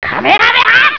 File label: menu command; dragon ball z